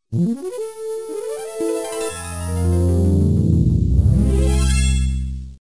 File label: Logo; Brøderbund